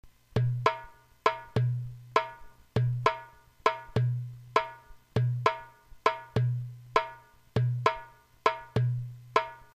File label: Doumbek